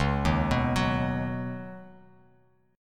C sharp 7th Suspended 2nd Suspended 4th C sharp Bass Standard; arpeggiated; chord; 9,6,9,11